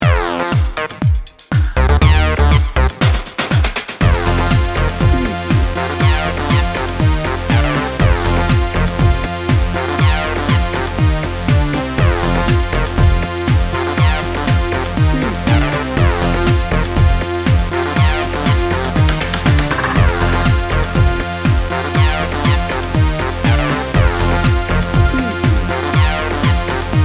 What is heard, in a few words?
dance party